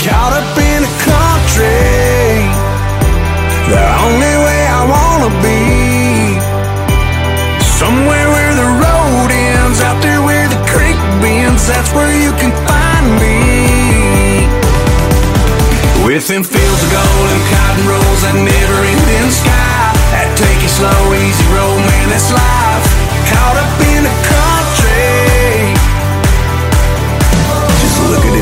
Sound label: country